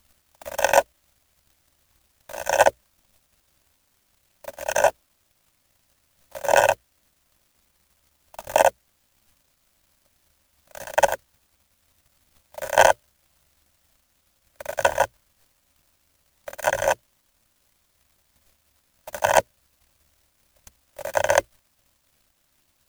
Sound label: snail; Snail Eats Carrot; smallsounds